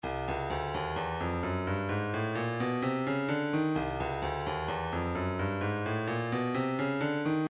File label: percussion midi files cabasa 129; Stylus; Sandpaper